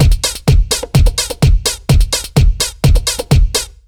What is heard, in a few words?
BEAT, Disco House; USB Soundscan vol.20; AKAI